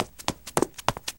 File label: Running Feet